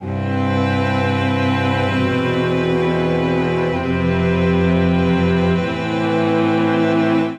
Progression Rustic Imaj7-iii-IV#11-vimin9 C Major 130 bpm, String Quartet; MIDI Clips; Ableton; Factory Packs; Spitfire Audio